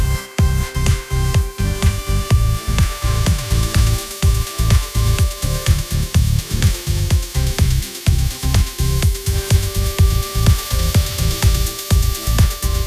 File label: k pop style